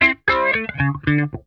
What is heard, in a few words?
RISE; Funk Guitar; Zero G - Funk Guitar